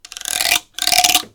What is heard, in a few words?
Guiro